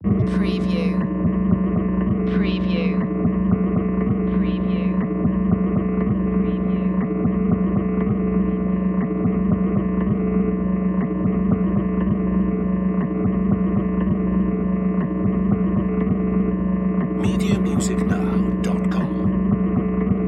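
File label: Bass Warning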